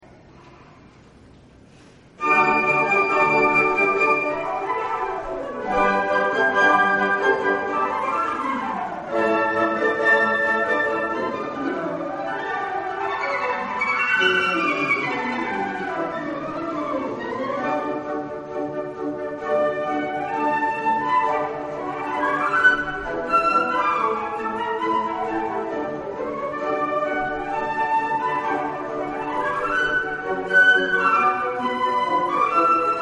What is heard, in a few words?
concert, flute